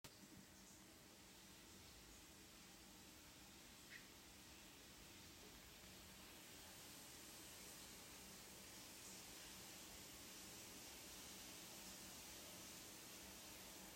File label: Shower